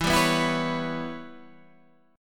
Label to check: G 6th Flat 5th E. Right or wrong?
Right